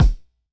Hammer Deep Hard Kick; Airwindows

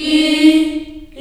D#3, Choir, CHILD; CHILD IHS

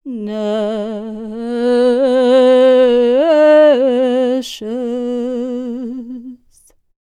SERBIA, Vocal World Voices SERBIAN

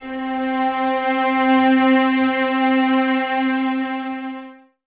cellos C 4, String Ensembles; Miroslav Vitous; 90 s Sample CDs

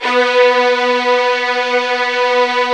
VIOLIN; VIOLIN1

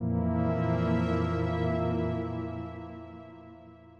70s sci-fi style, Stings; musicradar

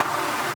note fathoms chord; Polyend; Recue; melodic

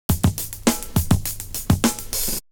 Beat; AMG

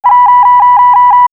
Elec FX Shrill Tones; X-Static Goldmine

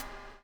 BUBL; Spectrasonics - Backbeat

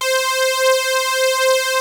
POLY SYNTH; Wizoo - Powered Wave

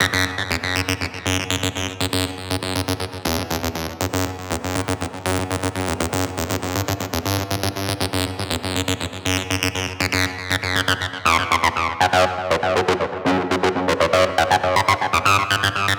Filtered Sweep, Trance; Sonic Foundry (Sony Creative Software); Trance NRG VOL-2

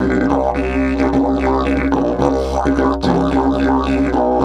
108, DIDGE 108BPM

